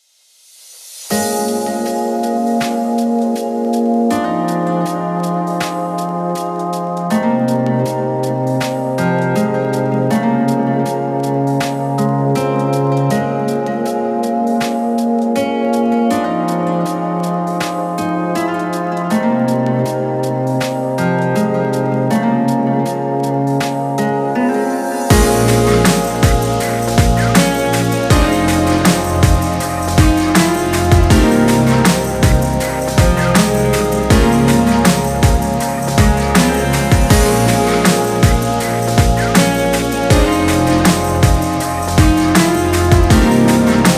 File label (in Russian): medlennzya spokoinaya muzyka